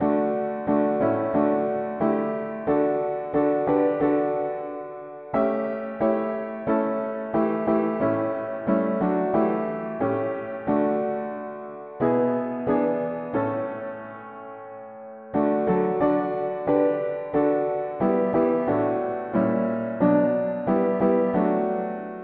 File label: mono piano; Dieu prouve son amour; hymns